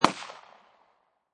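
Pistol Smith & Wesson P99 40 Cal Single Shot Exterior At 45'; Gun / Pistol: Smith & Wesson P99, 40 Caliber, Single shot, Exterior, at 45 feet distance.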